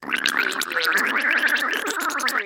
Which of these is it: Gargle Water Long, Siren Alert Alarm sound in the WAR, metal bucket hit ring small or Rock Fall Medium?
Gargle Water Long